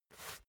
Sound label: basketball turn swipe short quick